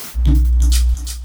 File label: throws; spatial; denoise